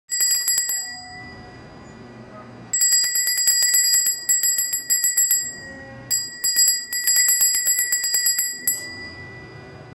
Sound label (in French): son d'une porte de magasin; retail